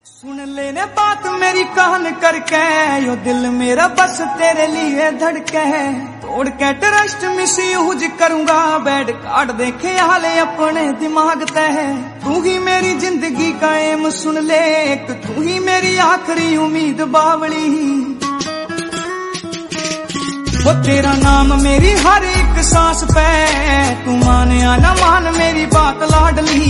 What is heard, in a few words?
haryanvi